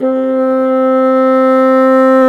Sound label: BASSOONS, Bassoon Swel; Roland LCDP04 Orchestral Winds; 90 s Sample CDs